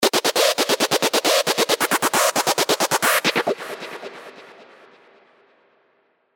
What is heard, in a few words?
SY Screech, sylenth1 hard techno; Flexing; revealed sylenth1 hard techno vol 1